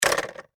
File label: Telephone Receiver Hang Up; Telephones; Electronics